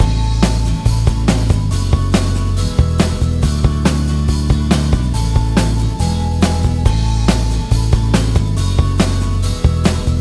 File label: Chorus loop